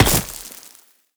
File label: dirt impact bullet; physics; surfaces